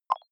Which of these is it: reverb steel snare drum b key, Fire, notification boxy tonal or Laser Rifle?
notification boxy tonal